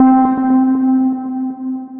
Synthesizer Middle C Notes, Lead

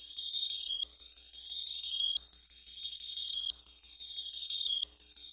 112 glitz tinitus, DRUM LOOPS and BREAKS

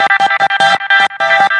150 synthagater, synth and electronic loops

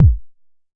Jomox MBase, drum machine; Jomox MBase 01

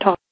ta, percussive and bips and clicks; Ze Franks Pain Pack

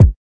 kik

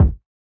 floppy bounce kick; Kicks; single hits

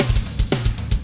115 big snare kick roll, drum loops and breaks

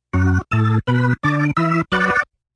organ

